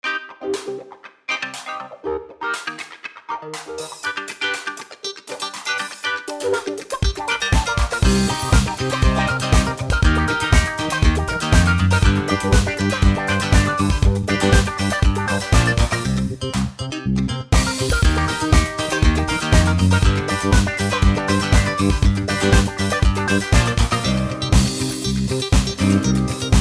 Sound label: funky